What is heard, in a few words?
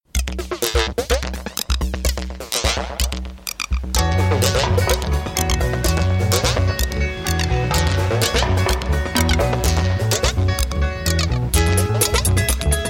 Flute Dance